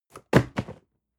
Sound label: cardboard box medium fall high hard; objekte